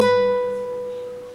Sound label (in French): si3 guitare